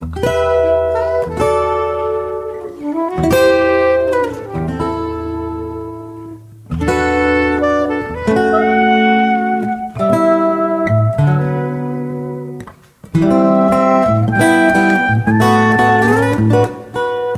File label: trio; 50er